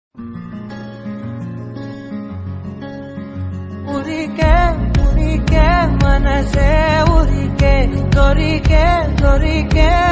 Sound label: Telugu Ringtone; Hit 2